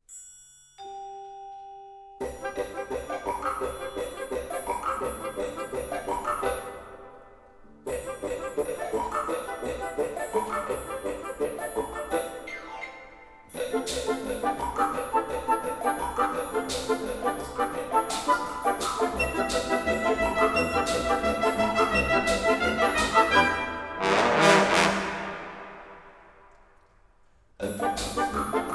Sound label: Woodwind Brass Mischief